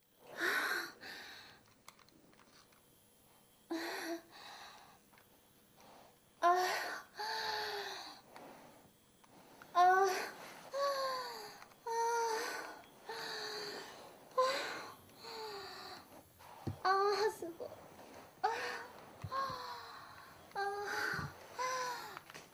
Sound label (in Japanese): マンコを性欲処理に使われる人妻の喘ぎ声